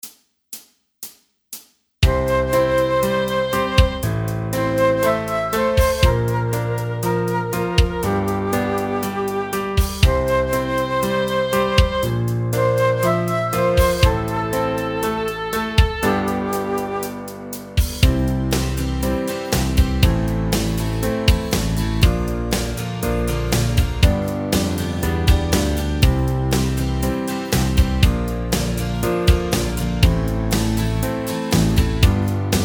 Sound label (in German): kyrie instr